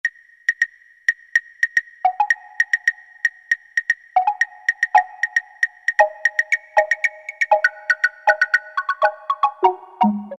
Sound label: CLAVES; Roland SN-U110-02